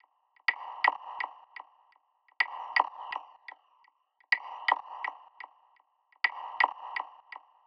Prc Fx Loop 125; Equipped Music; Loungin House; Perc Loops 125

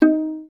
VAS PIZ D#3, Orchestra; Best Service; Peter Siedlaczek's Advanced Orchestra

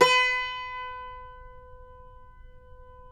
CUMBUS B, Guitars; Spectrasonics; Hans Zimmer Guitars VOL-2

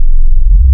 E Bass Shift, wavetables; Animoog

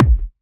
Kick, Drum Hits; Zero-G Trance Inducer; 90 s Sample CDs

